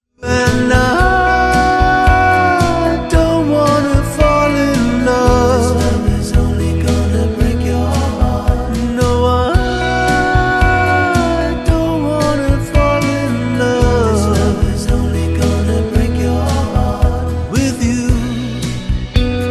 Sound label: Backing Track only Chorus